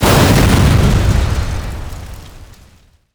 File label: boom